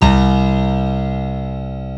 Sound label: PIANO C2; Big Fish Audio; Synth City